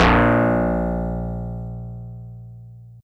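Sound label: Easy Clav DX100 A#0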